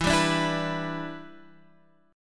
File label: E Minor 7th, down, Guitar, Standard; strummed; capo 9